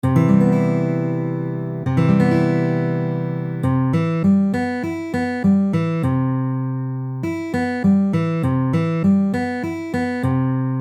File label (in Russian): Septakkord Cmaj7